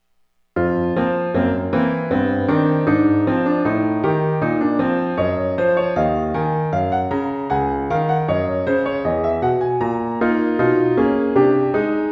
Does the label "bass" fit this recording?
No